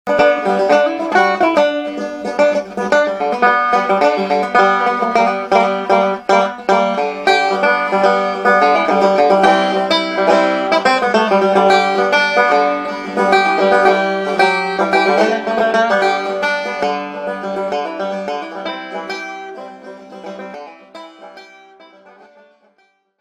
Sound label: Banjo